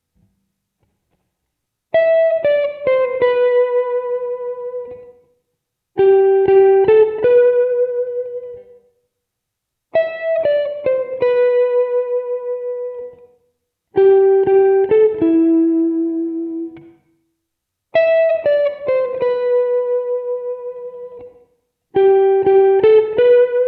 GTR Lush

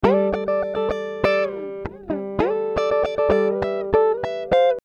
Dm 100 GTR Riffs

